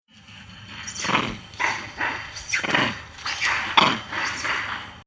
Nature Jungle Animal Monkey Several Long Tailed Macaques Shrieking Begging